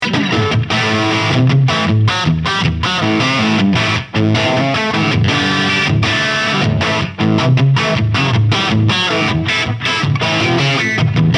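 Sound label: Crunch Single Coil, guitar amp; Harley Benton Mighty 15TH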